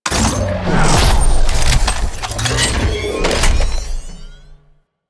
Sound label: Heavy Weapon Summon Arch Cannon; Warframe; Archwing